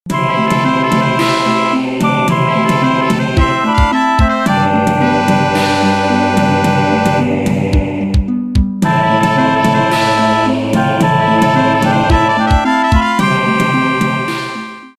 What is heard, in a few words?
ballad